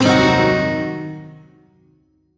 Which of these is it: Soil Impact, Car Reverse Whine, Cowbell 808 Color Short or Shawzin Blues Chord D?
Shawzin Blues Chord D